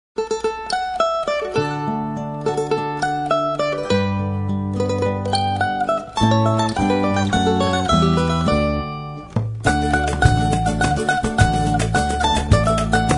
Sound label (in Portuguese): Natal De Cavaquinho Jingle Bells